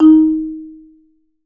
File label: MSOFT D#3, Marimba